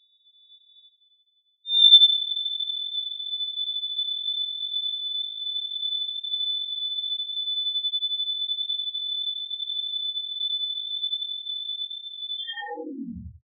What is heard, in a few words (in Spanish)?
Loops mutantes